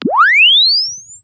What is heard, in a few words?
Press Two Sides Chirp; Samsung 4 Recordings